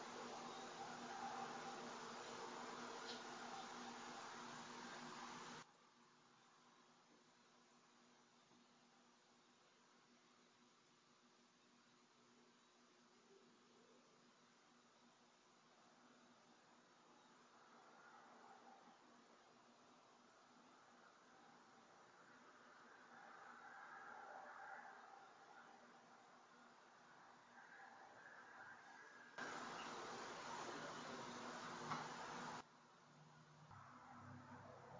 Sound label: Chapel Girls EVP; fairfield county infirmary return investigation